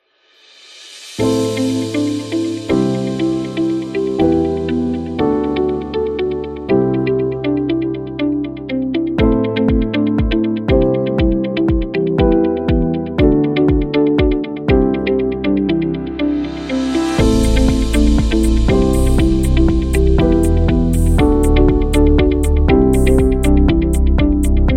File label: Calm Beat